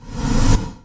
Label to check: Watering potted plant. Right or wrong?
Wrong